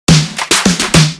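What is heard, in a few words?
drum SMS; Барабанная смс-ка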